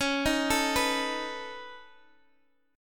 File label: Db 7th Suspended 2nd Sharp 5th, Guitar, Open D